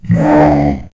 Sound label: beast roar